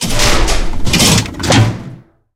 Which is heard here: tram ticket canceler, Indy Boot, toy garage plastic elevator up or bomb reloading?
bomb reloading